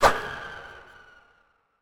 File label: swing; smite; cabrakan